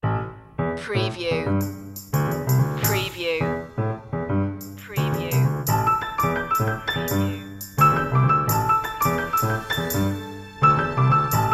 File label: contemporary piano, Jazzy Jazz Fusion